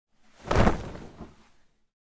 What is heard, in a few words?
Karate gi fight movements